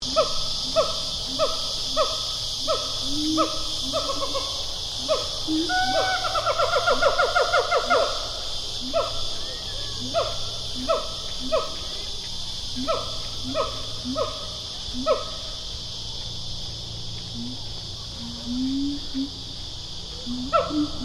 Animal Gibbon Siamang pair call, Gibbons in a zoo habitat; Monkeys; Animals